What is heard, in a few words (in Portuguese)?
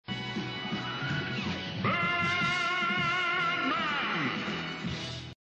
grito; birdman